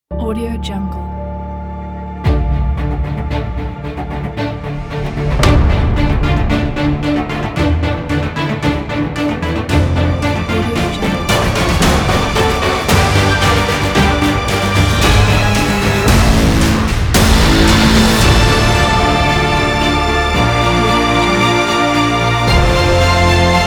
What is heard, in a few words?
modern action commercial